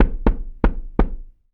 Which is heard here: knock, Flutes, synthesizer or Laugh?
knock